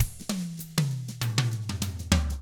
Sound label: funky, drums; ILIO; Double Platinum Drums 1; 99 FUNKY 2